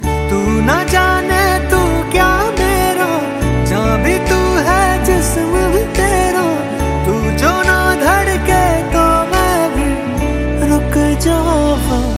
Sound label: ringtone bollywood ringtones